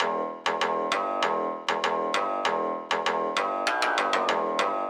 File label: egyptian fx; Garage Band